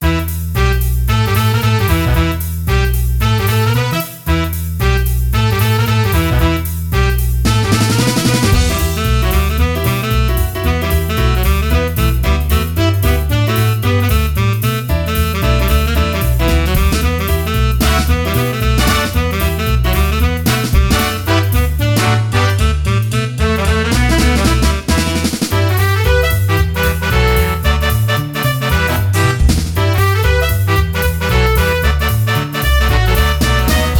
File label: A Jam Blues; Metal Gear 2 Solid Snake Remastered